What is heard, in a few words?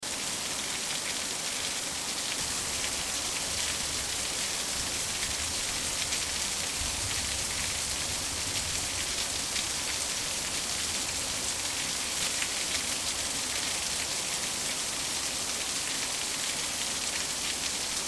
atmo rain arizona light rain